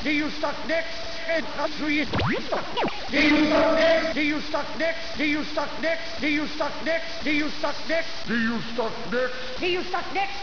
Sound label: pit drone idle